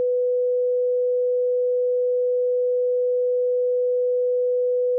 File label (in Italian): onda sinusoidale 496hz